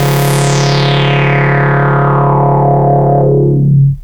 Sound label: zippo, Synths; Notes